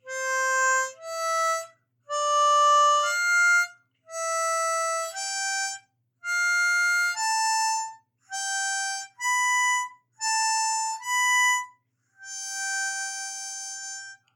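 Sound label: C Major Couplets, harmonica; The animals went in two by two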